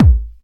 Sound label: hard bass drumz